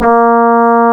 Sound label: A#, Synclav FM, BRASS; Big Fish Audio